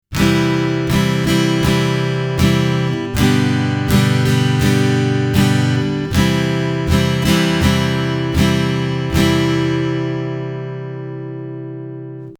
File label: easy strum, acoustic guitar